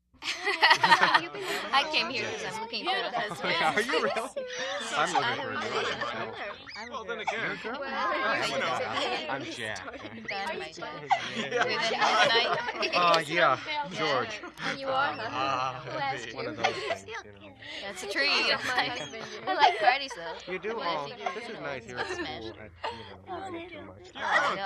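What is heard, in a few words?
Party Chatter Teenage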